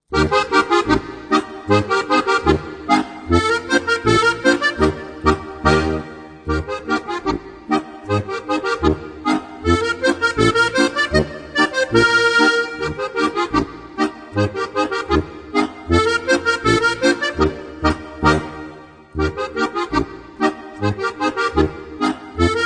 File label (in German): tusch bairisch